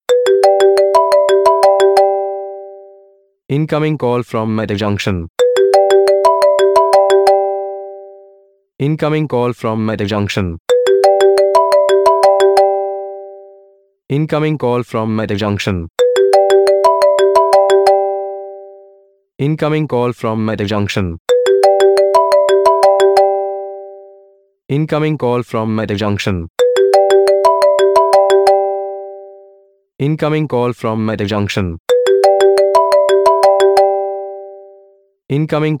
calltune

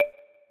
max bet button click; berry burst

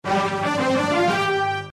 prefect; Ninja Man 2

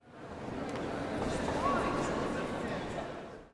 crowd Chatter theater; spellbound

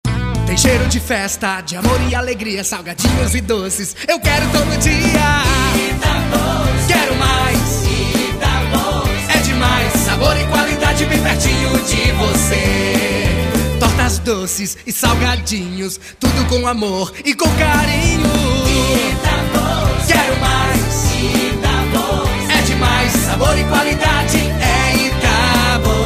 Jingles

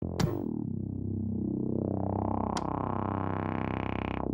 intro sound

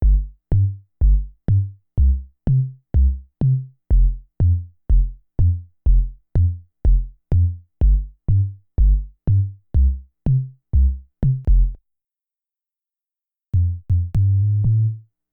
BASS

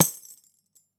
played

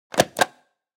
mail remove

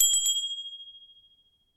alert

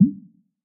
reel bounce; berry burst

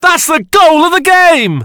goal

